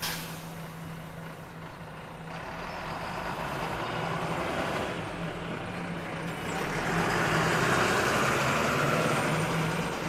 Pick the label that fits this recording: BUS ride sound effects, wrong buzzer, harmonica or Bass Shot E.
BUS ride sound effects